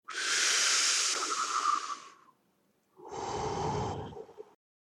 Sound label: breath